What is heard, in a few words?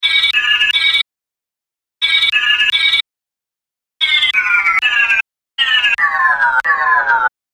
Phone Ringtone; Caprica